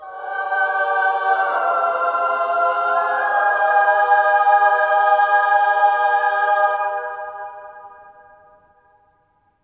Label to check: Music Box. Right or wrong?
Wrong